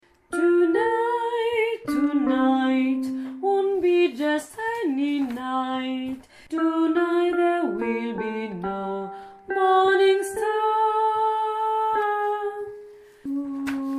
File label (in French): basses